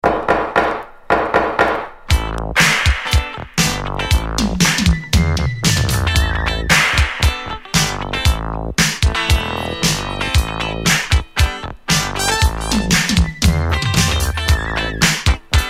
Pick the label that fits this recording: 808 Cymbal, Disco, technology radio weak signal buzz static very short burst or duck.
Disco